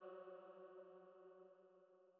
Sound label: Female Pad F#2